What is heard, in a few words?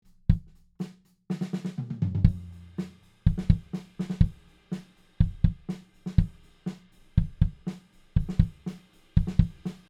SSL SiX CH Kick SM7B; ssl six ch channel strip 500 series preamp